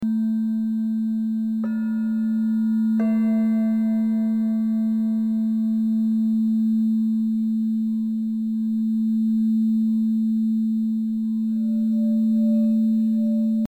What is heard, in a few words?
CRYSTAL BOWLS